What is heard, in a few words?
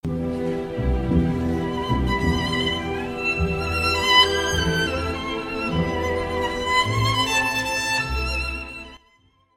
Violin Concert fragment; Mendelssohn